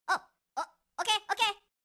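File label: les minion sms, sonnerie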